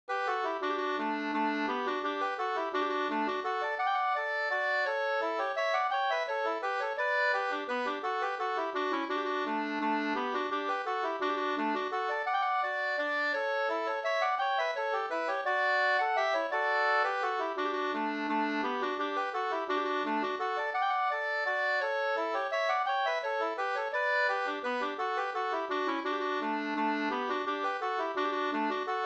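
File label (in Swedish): vals, two-part; Balzerjungfruns vals av Ragnar Forslund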